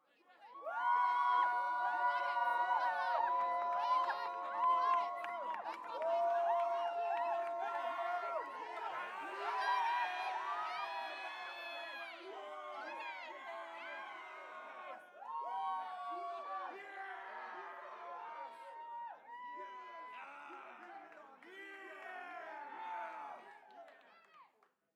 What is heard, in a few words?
South African Bars; TheWorkRoom Audio Post